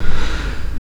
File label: Fx Vox; Equipped Music; Loungin House